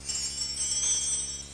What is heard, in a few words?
bell